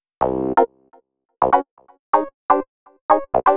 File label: 125 Clavy Riff C, Keys, Nu Jazz House; PowerFX - Nu Jazz House